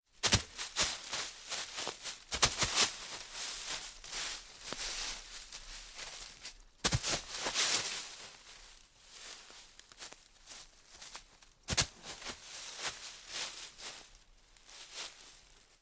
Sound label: play in pile of leaves